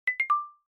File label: rest sound